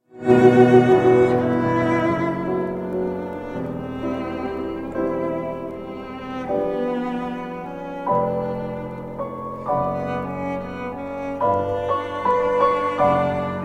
sonata in d major for cello and piano 2nd movement adagio lamentoso; William Hurlstone